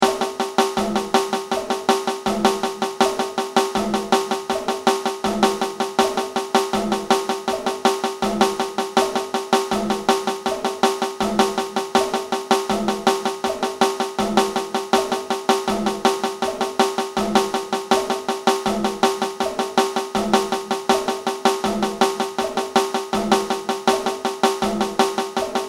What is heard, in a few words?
Bossa Nova medium